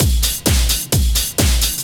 drum 130 straight; Techno Trance Essentials

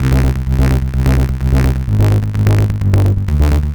bass, analogue circuit, 128bpm; musicradar

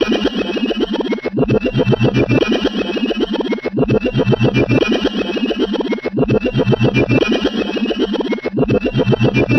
Discharge, Machinery; Sonic Foundry (Sony Creative Software); Myths of Technology

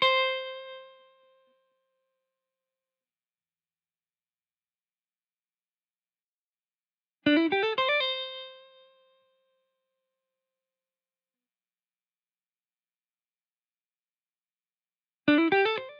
Guitar Bpm120 Key A minor, 70s Guitars; Fox Samples - Back to the 70s Guitars

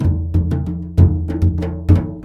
MLY DRMS; Spectrasonics - Heart of Asia CD2

